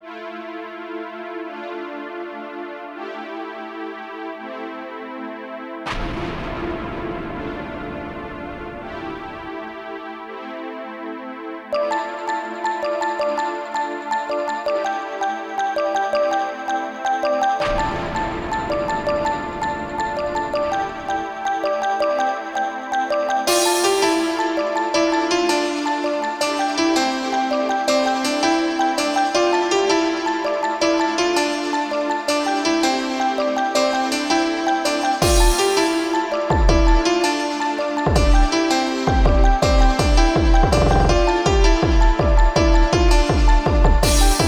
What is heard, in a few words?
rainy piano